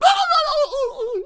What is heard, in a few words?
Sensei Death Vocals